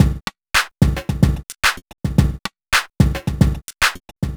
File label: r n b kit 110